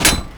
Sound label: Metal Crate Hit; Gameplay; Warframe; Tau; Destructible Dynamic